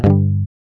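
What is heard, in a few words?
GUI G, acoustic guitar; Best Service Gigapack 2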